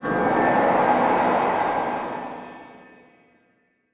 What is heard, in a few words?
magical wind twinkle mysterious